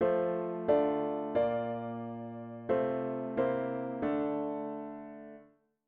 chord prog chunks diat inv and V7

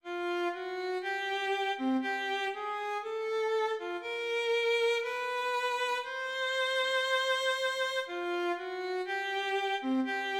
Mel dict chromatic long

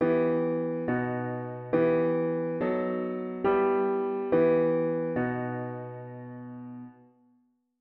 Harm Dict diat inv V7 inv bass

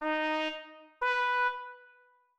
int up Eb B

